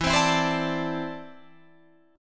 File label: E 7th Suspended 4th Sharp 5th, Guitar, Open F; E7sus4#5; strummed